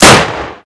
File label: fiveseven